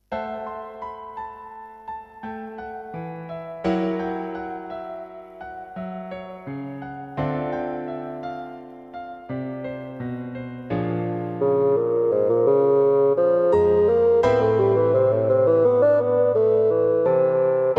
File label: A very edgy piece in 5/4 time; Bassoon Solo and Piano; Lots of accidentals to get your fingers around.